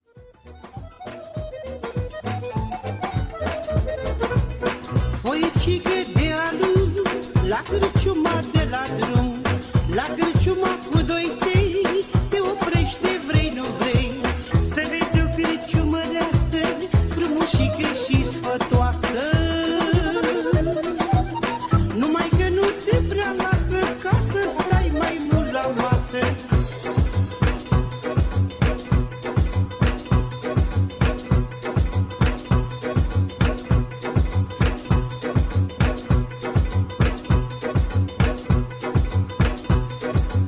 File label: Funky Balkanos